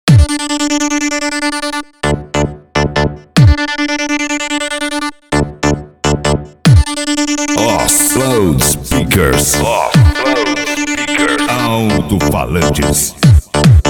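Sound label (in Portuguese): alto falantes loudspeakers 5250 w agressive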